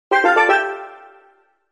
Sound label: COMPLETE; UISound